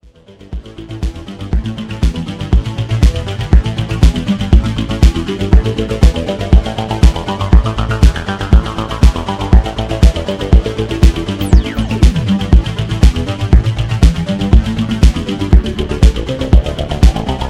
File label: synthing